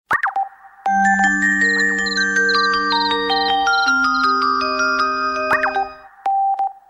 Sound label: Trrrrrr